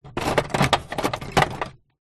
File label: industrial metal tools in metal tool box movements rummage; zapsplat